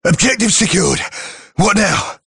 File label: capture complete; guardsmen; warhammer